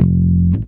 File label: bass (F) long note; Sonic Foundry (Sony Creative Software); Ugly Remnants VOL-2; Basstuff